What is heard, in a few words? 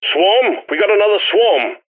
Wave Alert Generic; Deep Rock Galactic Boardgame; Mission Control; Swarm